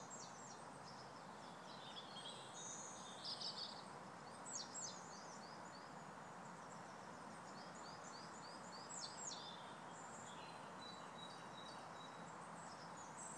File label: birdsong